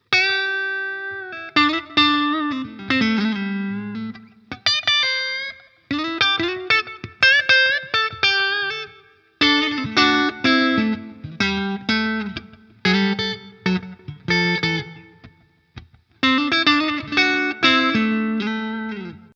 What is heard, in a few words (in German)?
Hals SC clean